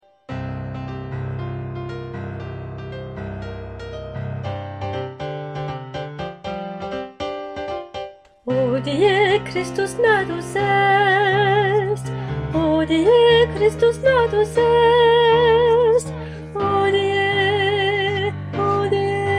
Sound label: Christmas fanfare